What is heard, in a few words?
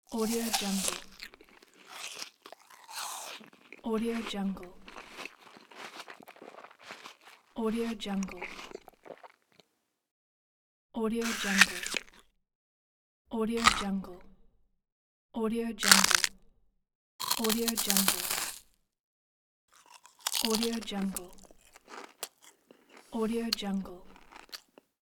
bite food kit